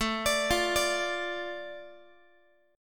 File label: A Suspended 4th Sharp 5th, Guitar; Asus4#5; arpeggiated; x,17,17,15,18,x